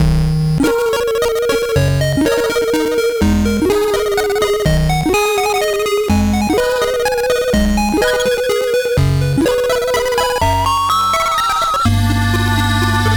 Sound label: 8bit BGM